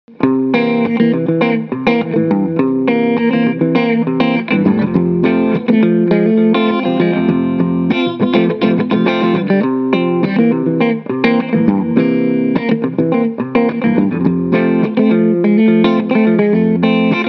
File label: COMP-1 E2 Moderate